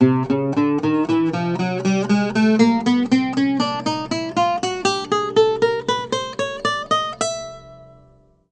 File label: lute rang; orquesta; Instrumentos